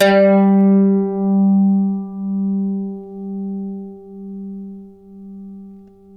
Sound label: g+2, Mandolin; Terkelsen